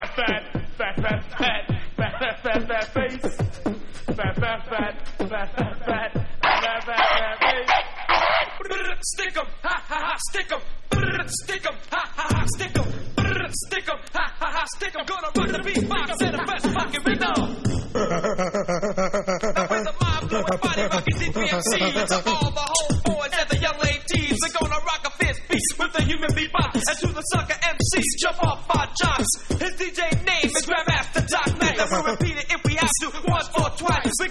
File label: HUMAN BEAT BOX